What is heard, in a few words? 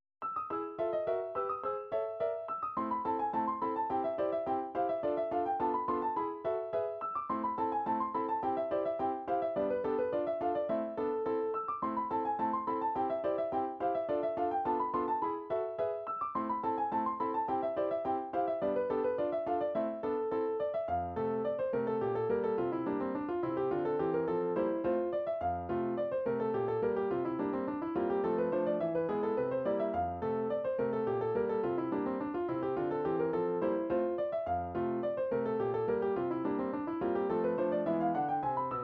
hornpipe for piano solo; maccunn